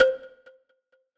BELL Kalimba Box; Synthetic's Serum Collection

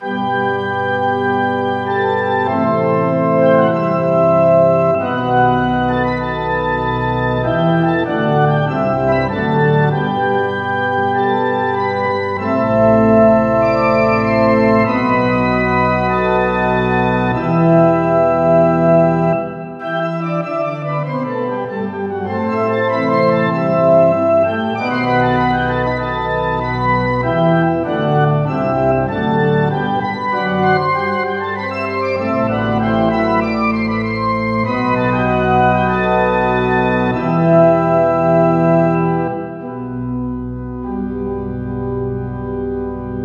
ORGAN

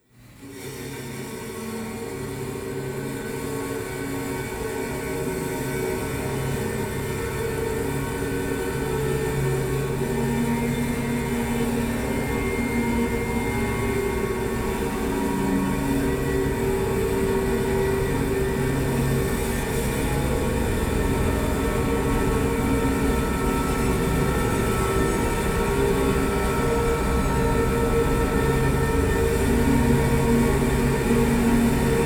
Resonant Wire Enclosure

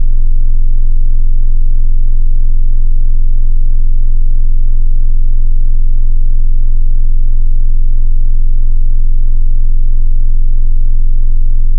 Triangle C3, Sub 37; Synthetic's Serum Collection; EvoSounds

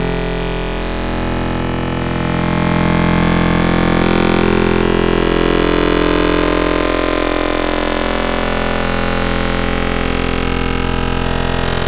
FM - X Noise; Wavetables; Synthetic's Serum Collection; ESW Core Wavetables; Echo Sound Works Core Tables

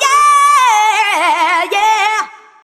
vox yeaaaheheh; 'Effection' samples; lolicore

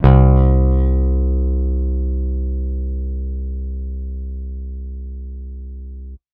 elect Bass C2; Serum elect Bass WT; ESW Floom V.1

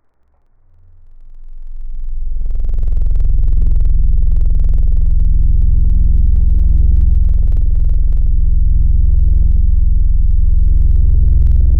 Flute, wavetables; Synthetic's Serum Collection; Standalone-Music SYMPHONY Wavetables

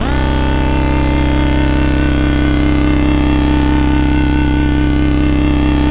Tron Lead; Cymatics; Noise Osc Samples for Serum

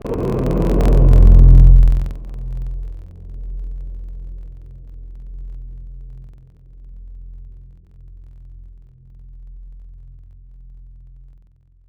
Flute Stac C3; Synthetic's Serum Collection; Echo Sound Works Sphere

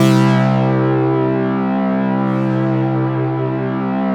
Harmonic Lead; Cymatics; Noise Osc Samples for Serum

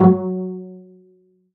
Noise F#; Synthetic's Serum Collection; StiickzZ - Sticky Future Bass Vol. 2

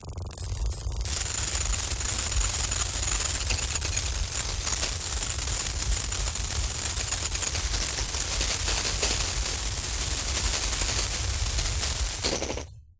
static

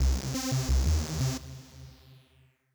fat synth riff; 'Effection' samples; lolicore

